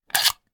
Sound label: Hand Gun Foley; Sound Ideas; Guns